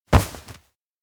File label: grip arm; awayuki; anemoi パッケージ版 1.1